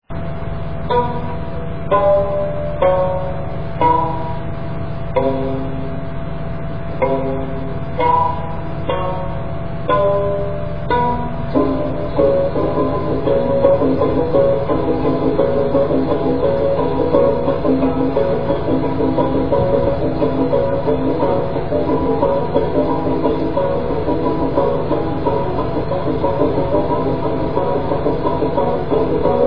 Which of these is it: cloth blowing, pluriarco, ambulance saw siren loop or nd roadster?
pluriarco